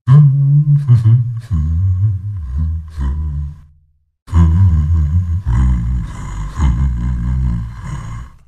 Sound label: Desecrator Hum